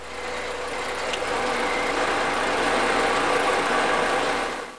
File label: jet; My Science Fair